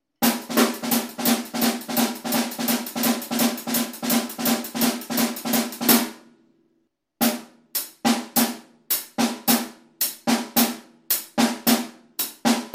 jungle exercice

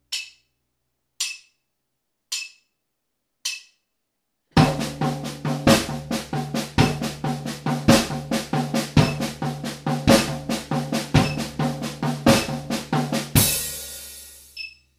5 chans 2 petit tom caisse claire

